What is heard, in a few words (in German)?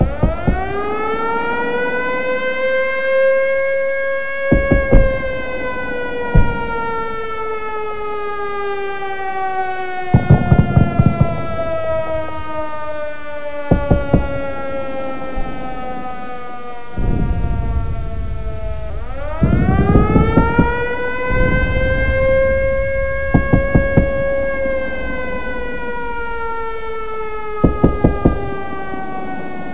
sirene; dod